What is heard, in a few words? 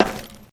metal footsteps; player